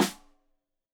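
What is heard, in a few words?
Snare Stereo, Drums; Deeply Sampled Drums; SMDrum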